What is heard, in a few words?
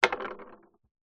candle wax set down roll on wood surface; foley; zapsplat